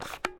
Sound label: toy gun reload; weapons; NS Studios - ultra sound collection